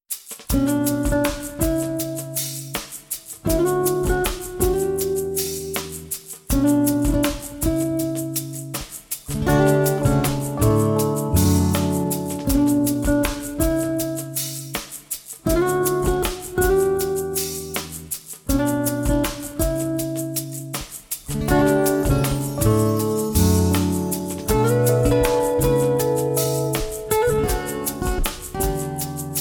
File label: acoustic, 432hz; music in action